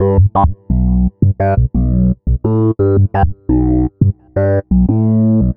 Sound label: Synth Bass; Sonic Foundry; Leo Cavallo Bass Taster